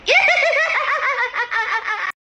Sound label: goofy laugh